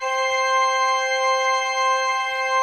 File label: C4, Cathedral Organ; ILIO; Propeller Islands